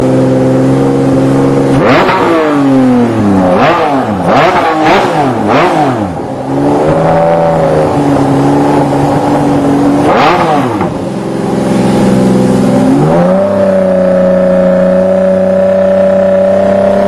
ferrari enzo

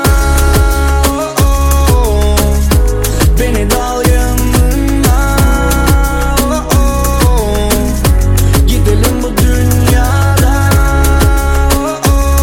ringtone foreng

